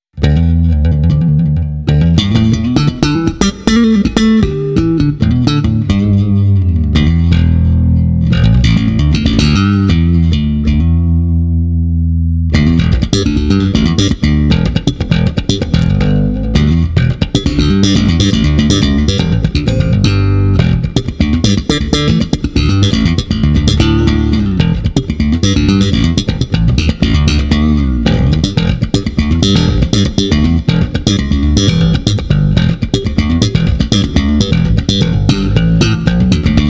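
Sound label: solo slap, basso elettrico artigianale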